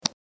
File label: click; goldenClover